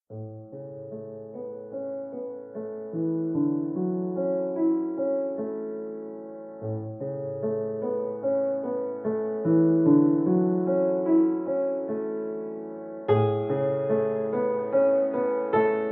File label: (piano2); Minecraft